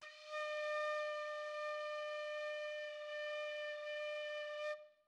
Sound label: flute